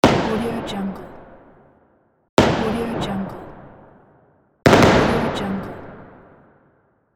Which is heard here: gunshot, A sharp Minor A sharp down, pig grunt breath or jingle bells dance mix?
gunshot